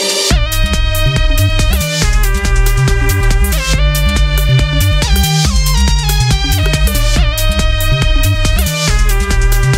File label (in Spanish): Toque Para iPhone Apple Ringtone